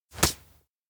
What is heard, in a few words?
nudge; ken; anemoi